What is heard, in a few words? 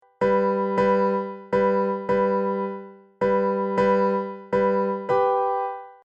keyboard